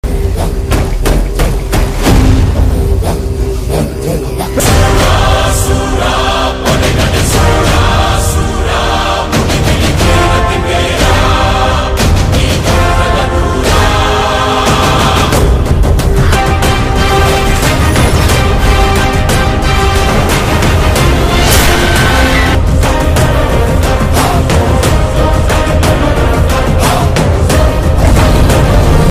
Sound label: Epic Ringtone Trailer BGM Ringtone; kanguva; bobby deol